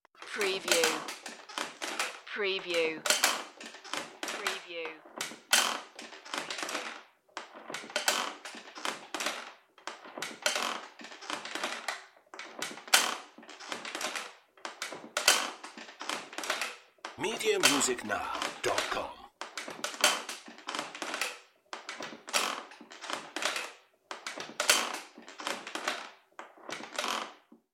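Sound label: Creaky Rocking Chair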